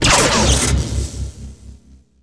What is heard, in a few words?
laser tank fire